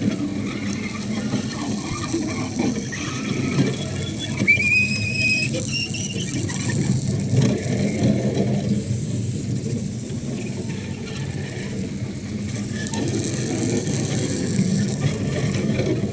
Rusty Old Wheel Loop

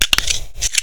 Smg5 Reload

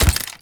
heavy walking chains

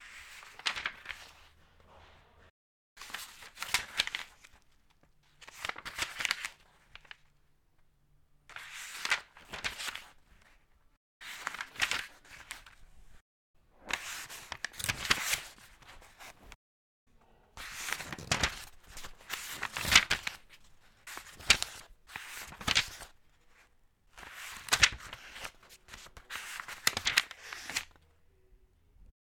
Turning Book Pages

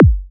Club Kick

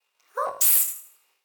Ups Robot

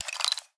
Light Wood

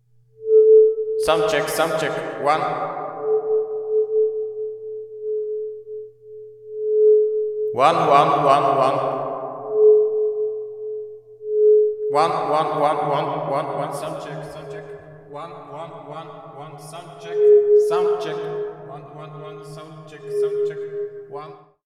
Hall Soundcheck With Feedbacks

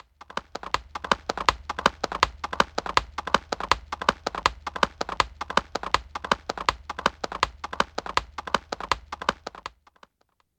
Gallop

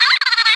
Bug

